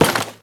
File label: Worker Step Default; Warframe; Solaris